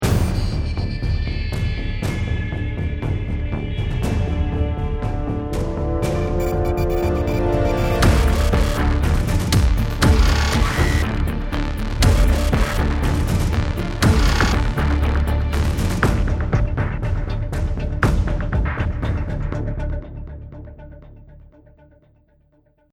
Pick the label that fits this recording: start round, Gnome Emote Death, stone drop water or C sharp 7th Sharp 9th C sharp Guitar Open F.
start round